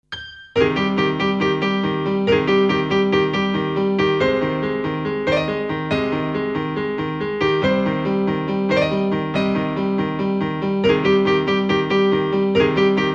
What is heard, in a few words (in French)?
larme